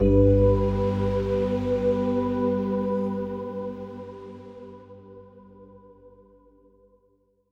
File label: Stingers sting positive